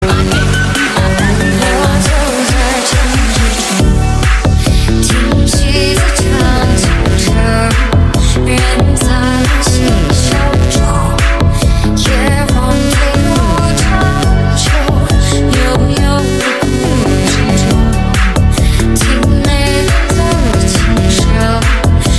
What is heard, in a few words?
remix